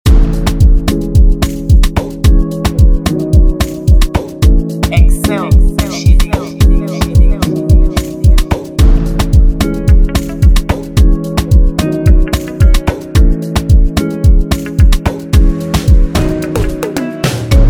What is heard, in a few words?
JERSEY CLUB AMAPIANO